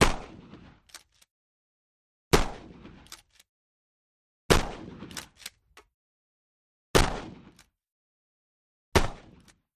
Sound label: 12 gauge Pump Action